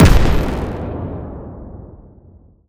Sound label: combat drone weapon explosion; Bluezone Corporation